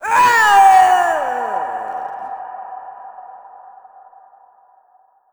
death; Crypt of the NecroDancer

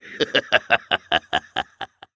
vo laugh; Crypt of the NecroDancer

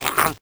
mimic chase; Crypt of the NecroDancer

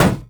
barrel hit; Crypt of the NecroDancer

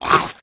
mimic attack; Crypt of the NecroDancer

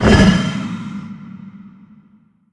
sarcophagus erupt; Crypt of the NecroDancer

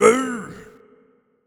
blues king hit; Crypt of the NecroDancer

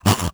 goblin toward; Crypt of the NecroDancer

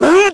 squish; Crypt of the NecroDancer

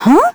hm; Crypt of the NecroDancer

